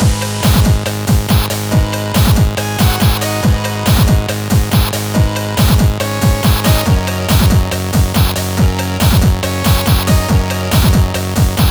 Chiptune; Chiptune Music Pack Vol. 4

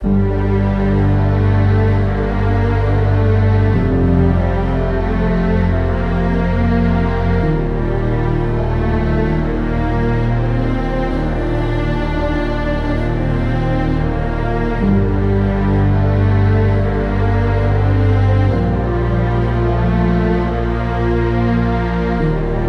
Orchestral Ambient

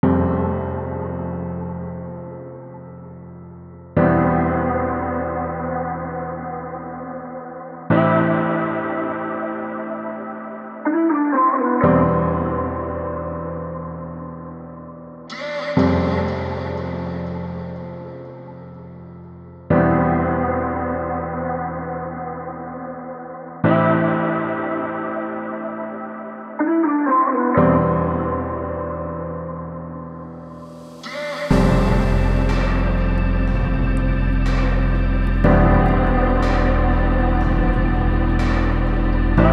Ambient City Rain; Ambient Music Pack Vol. 6

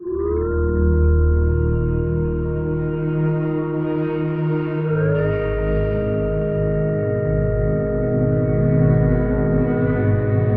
Ambient; Ambient Music Pack Vol. 6

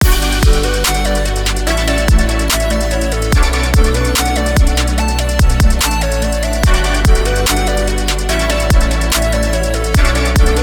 Synthpop First Flight; Synthpop Music Pack

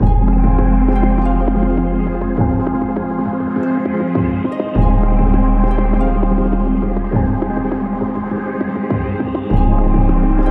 Ambient; Ambient Vol 4 Music Pack

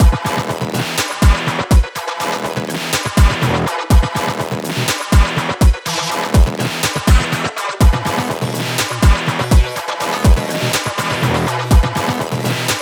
Heavy Electronic; Heavy Electronic Music Pack Vol. 2

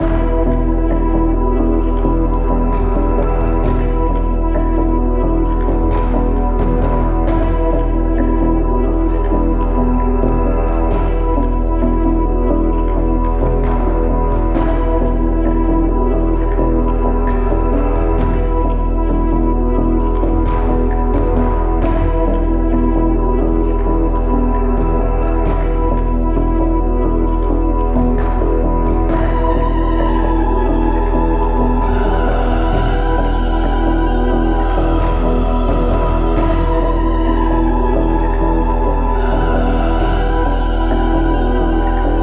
Ambient; Ambient Vol 4 Music Pack

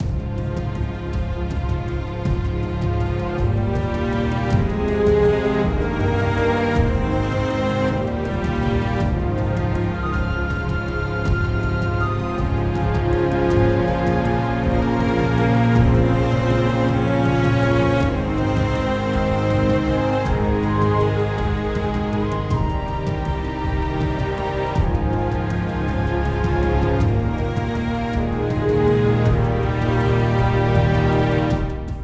Orchestral Ambient; Orchestral Ambient Music Pack Vol. 2

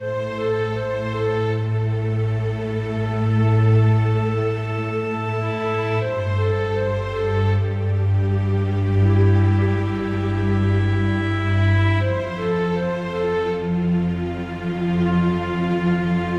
Orchestral Ambient Nocturnal; Orchestral Ambient Music Pack

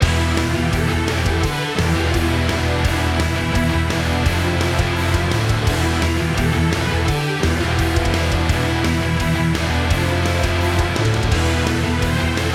Orchestral Rock Engage; Orchestral Rock Vol 2

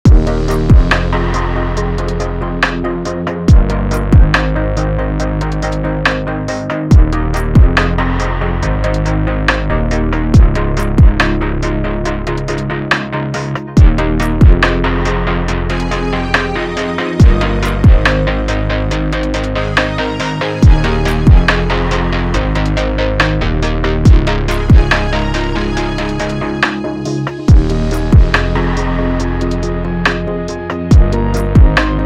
Synthpop The Arcade; Synthpop Music Pack

